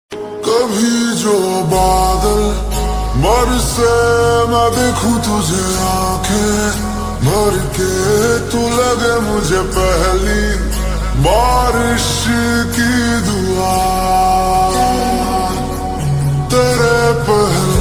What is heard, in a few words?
Ringtone slowed reverb